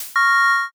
reveal

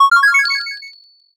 heal

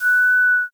flute

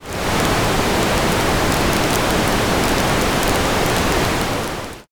fire

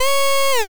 air horn

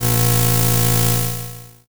weld

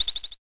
monster bat

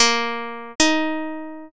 biwa

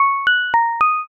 chime broadcast

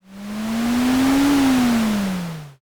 ship pass

